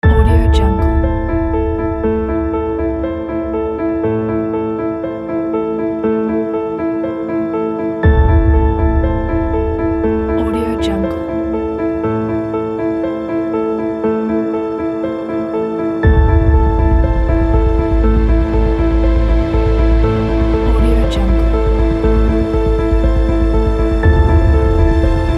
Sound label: epic